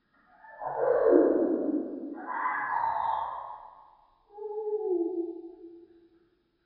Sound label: bird budgie song; dfcaverns; ambience